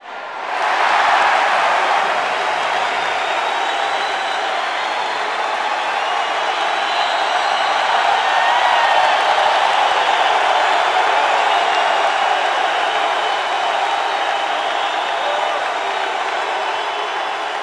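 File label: Basketball Crowd Cheer; SFX Kit; Crowds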